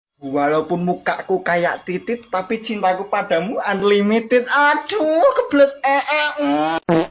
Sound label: eek fart